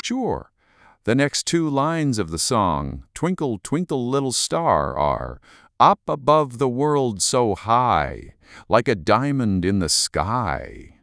sing

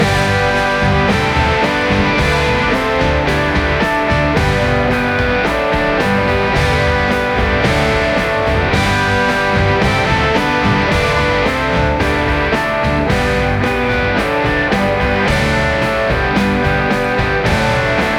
Beethoven rock

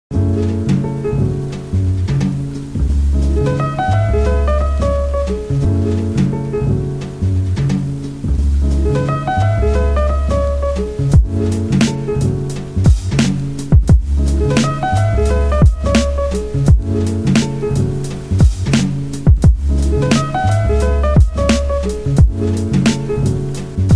Soothing Instrumental

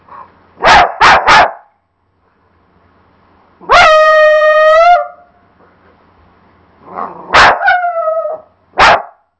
Cat dog

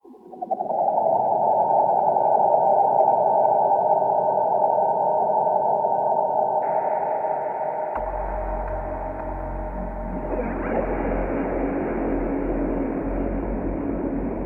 lofi Hip Hop

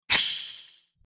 magic apparition

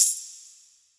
tambourine; autodrummer

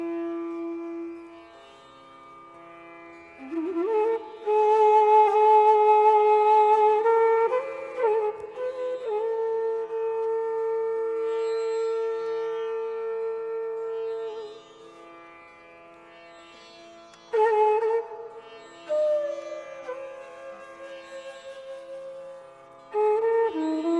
Shivranjani, hindustani raag

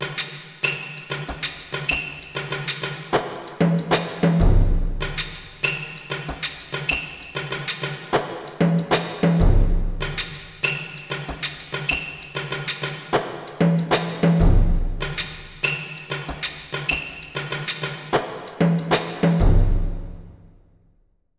drumming jungle music

